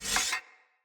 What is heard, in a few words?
Unequip, Swords; Weapons; Player; Mockery Weapon Common